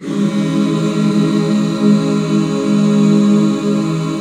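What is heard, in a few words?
C# minor; Best Service Gigapack 1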